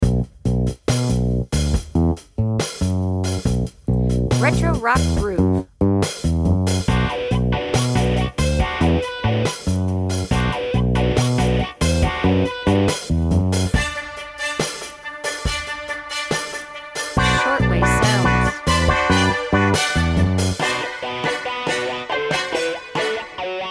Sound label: Retro Rock Groove